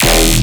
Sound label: Bass Hit E, Dubstep